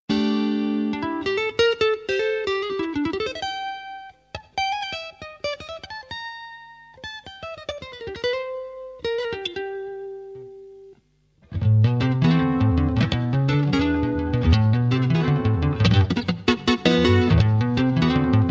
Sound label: Brazilian Rhumba